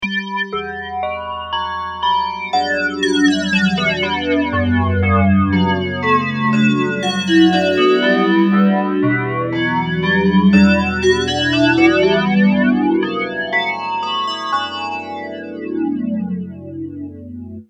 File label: DX7 bells, ADA flanger